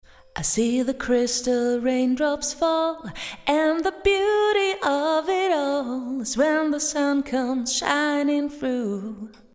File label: Reverb